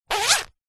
foley bag leather small handbag or pouch front compartment pocket zipper; zapsplat